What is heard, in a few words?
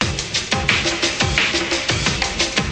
88 bpm mega gate with congas